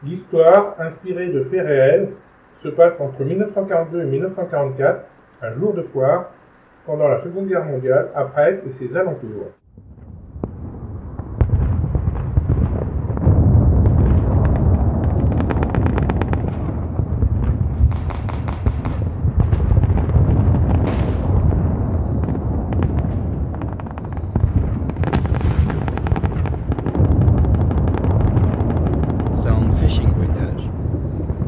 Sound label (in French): Bruits de guerre